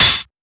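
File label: HH, hi-hat; Sonic Foundry (Sony Creative Software); Esoterik Beatz; Cricket; Criket Kit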